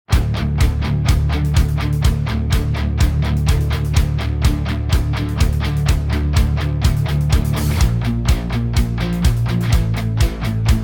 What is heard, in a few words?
Guitar